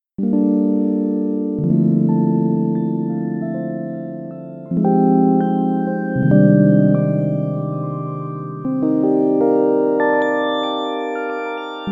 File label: prophet08 sampled